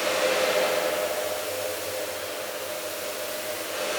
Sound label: Sample n Hold Verb Loops, sparse soundscape; SnH Verbs